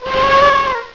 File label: Yawn; pokerogue; battle anims